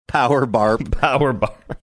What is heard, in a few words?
power barp